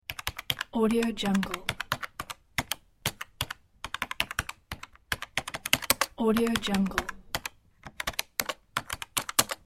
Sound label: aggressive keyboard typing